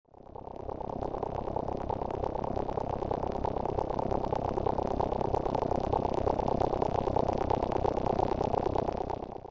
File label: strange spacey hum